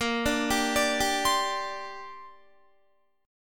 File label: A sharp 6th Add 9th, Guitar; A#6add9; arpeggiated; 18,17,17,19,19,19